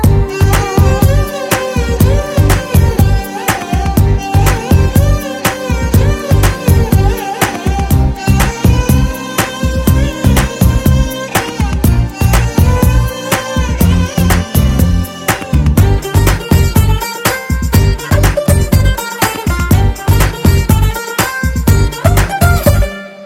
BG Tone Telugu Ringtone

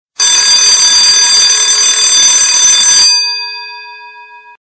Building Siren Ringtone

